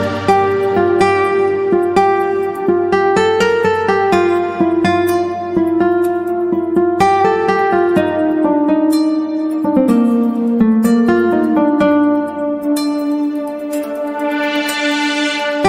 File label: ringtone instrumental; hindi